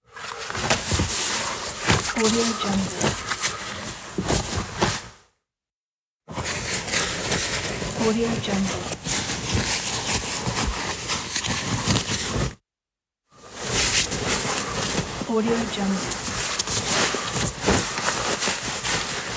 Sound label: clothes rustle